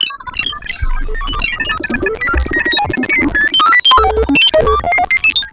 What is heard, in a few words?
Futuretro computing